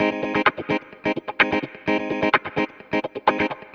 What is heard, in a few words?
Electric Guitar Kit G# maj, 128BPM; Vengeance Samples; Special Sounds